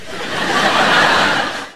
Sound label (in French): rires